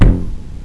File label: bow slow